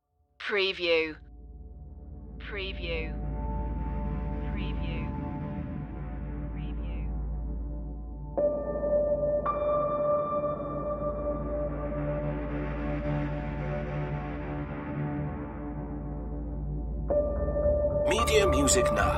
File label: dystopian machine no sfx